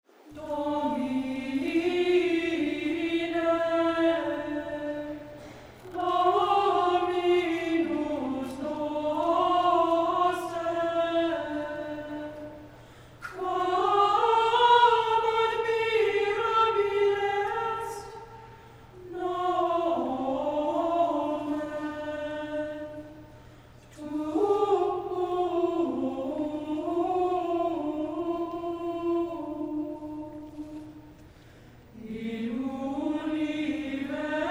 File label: Chant; Domine Jesu